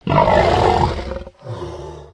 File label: boar anomaly death